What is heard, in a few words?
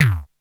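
KPR77 bass drum; Crypton - DrumBase5000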